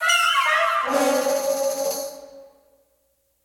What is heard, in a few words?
Death Fall; King's Quest Chapter 1: A Knight to Remember